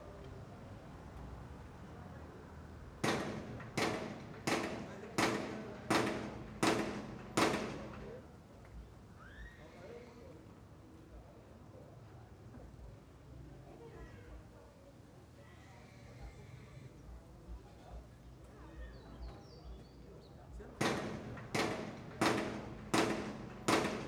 Marteladas no metal

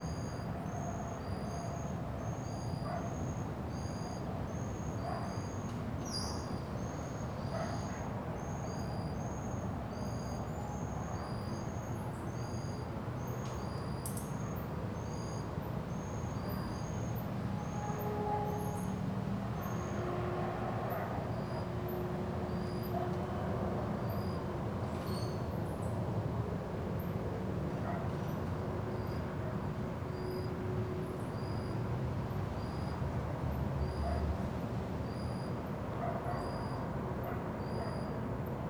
Ambiencia residencial goiania noite aviao passando som diferente melodico; grilos; ambiencia urbana

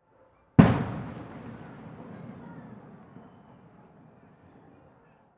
Explosao de foguete bem reverberante em cidade pequena; Colecao Sonora do Cerrado

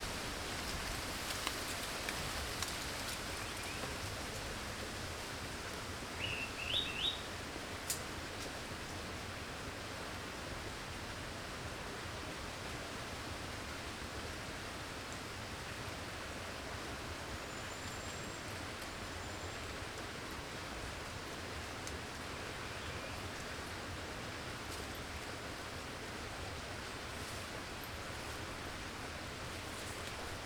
Riacho ventando nas arvores e passaro no inicio; agua

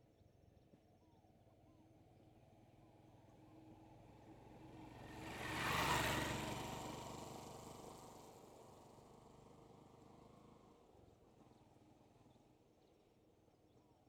Moto passando pela direita em ambiente rural com passaros; maquinas; colecao sonora do cerrado

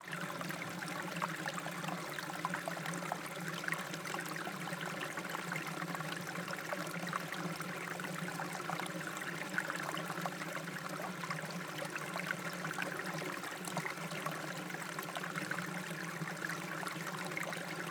Pequena corredeira de agua sequencia de uma pequena queda dagua; Cerrado

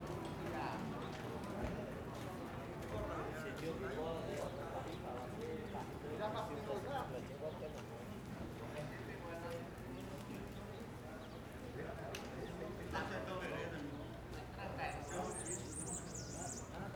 Ambiencia em frente a mercearia com pessoas, passos e bicicleta

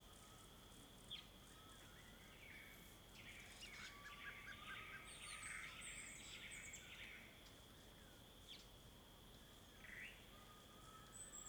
Ambiencia entardecer muitos passarinhos, abelhas, alguns estalos e rio

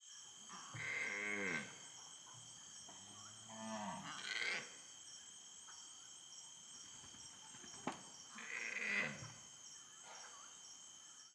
Bezerros Mugindo no Curral; animais; Coleção Sonora do Cerrado

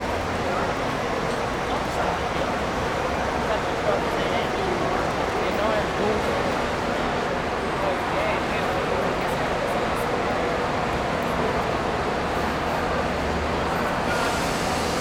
Ambiencia rodoviaria do plano piloto varios onibus, pessoas, moedas; e passos

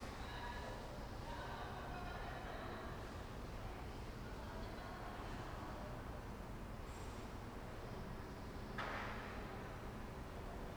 Ambiencia unb espaco semiaberto com passaros, poucas pessoas e transito lo; universidade de brasilia

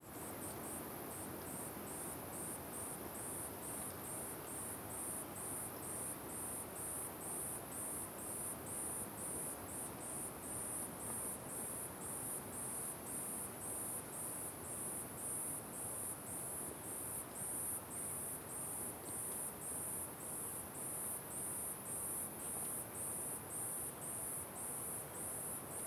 Grilo Muito Agudo em Mata Fechada com Vento Forte; insetos

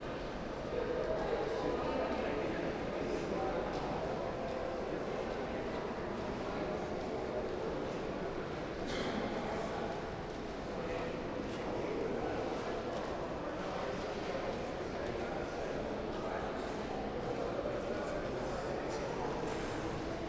Ambiencia rampa de entrada anexo 2 camara dos deputados hall com pessoas; e carrinho; ambiente interno; colecao sonora do cerrado

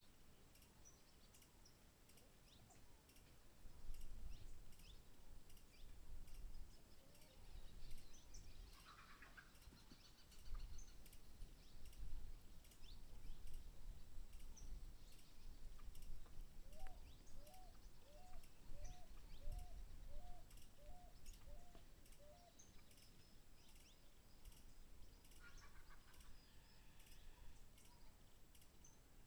Vento com galinhas e varios passaros

